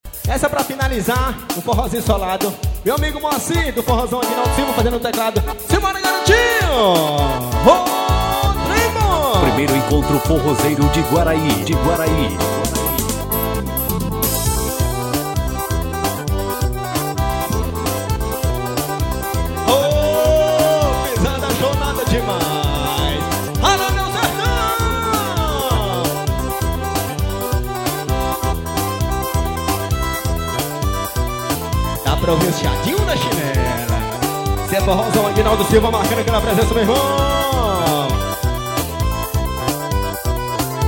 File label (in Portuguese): forro solado ao vivo